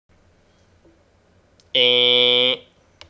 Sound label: buzz wrong